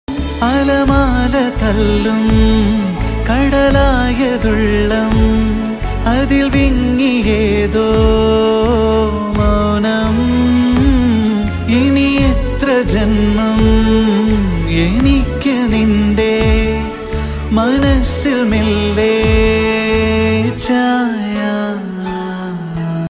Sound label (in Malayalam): malayalam ringtone; anugraheethan antony